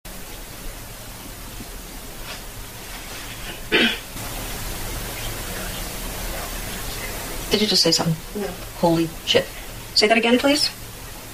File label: bonnie bedroom, whispering; Regular EVP/EAP's; This one was actually heard by one of our team members. But on audio is hard to hear exactly what is said.